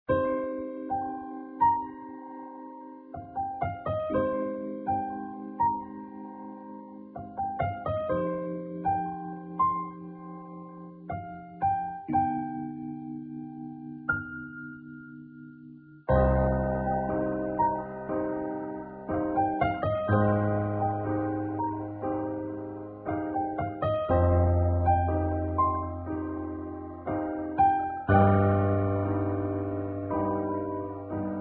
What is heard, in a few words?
leitmotiv piano; Linda Leitmotiv